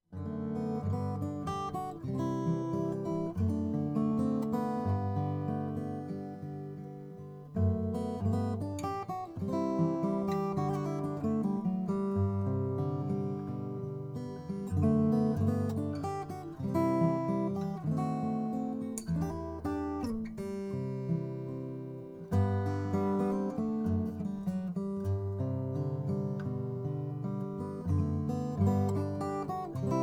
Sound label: Traugott 00 Brazilian rosewood